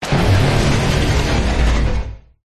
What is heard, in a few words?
Sci-Fi Explosion; Ryan Van Eerde